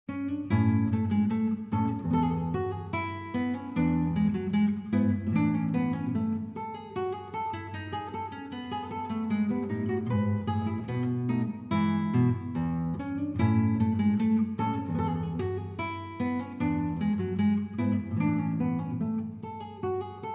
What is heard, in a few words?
bouree; weddings